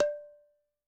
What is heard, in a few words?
Tabla OV, Yamaha DTXpress-II, Perc